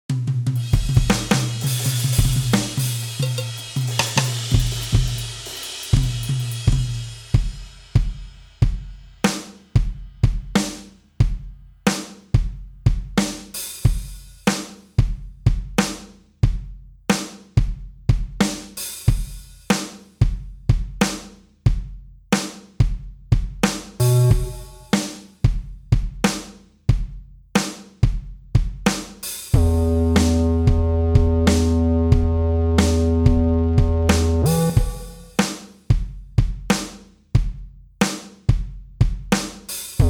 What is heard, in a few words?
a nice intro instrumental